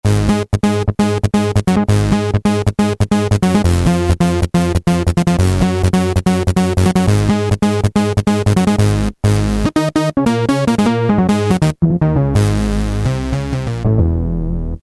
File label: VCF Bass; Roland M-DC1